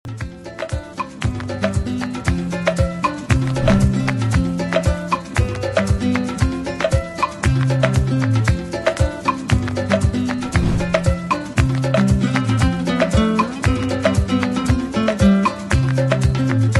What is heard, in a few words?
JINGLE; EB Son video Keliba; ebenebaobab